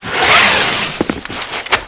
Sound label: rappel initial pushoff